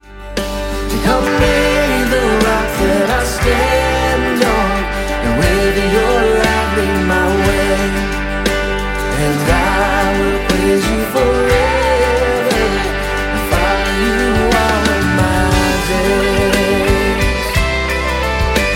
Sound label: chorus ringtone